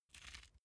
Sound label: small pepper grinder soft quick